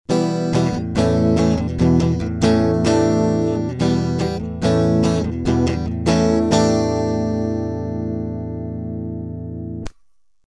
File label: UA 476p gtr